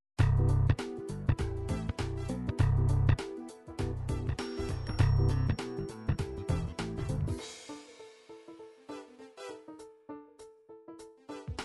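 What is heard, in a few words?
funk fusion shuffle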